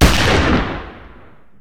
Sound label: Gun, Hand, Black Powder, Single Shot; gun and weapon sounds; captain audio; fully loaded